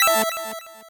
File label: 8bit se